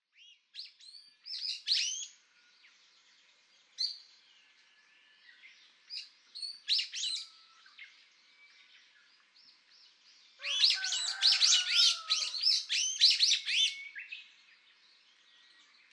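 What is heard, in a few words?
Brown-eared Bulbul; hiyodori; hahajima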